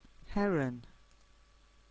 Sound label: heron